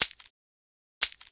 Scorpion idle

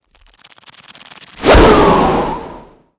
King Crab Skill

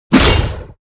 Guardian Attack; Seer; GamigoNA

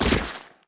Vampire Bat Die

